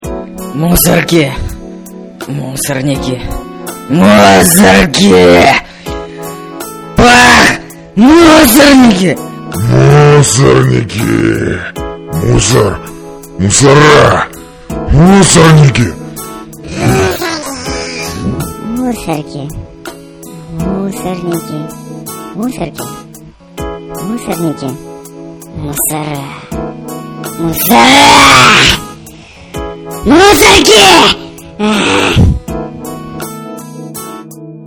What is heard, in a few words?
thrash